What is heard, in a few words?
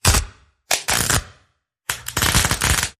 Electrical Sparks